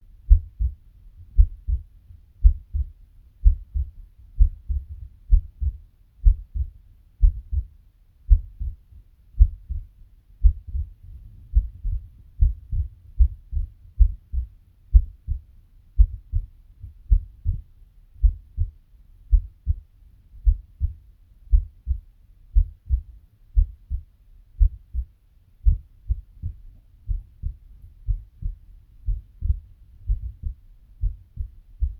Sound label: Heartbeat